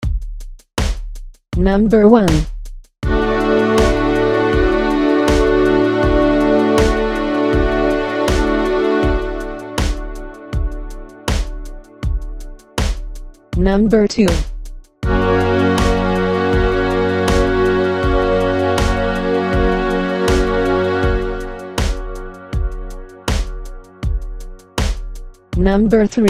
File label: chord quality id; harmony